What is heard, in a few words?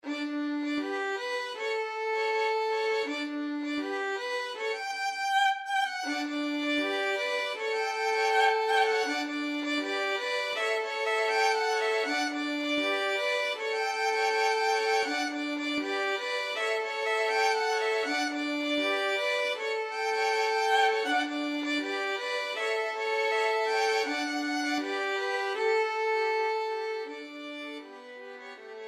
violin trio; la bamba